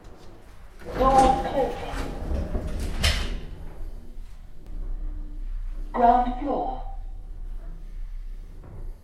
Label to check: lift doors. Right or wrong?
Right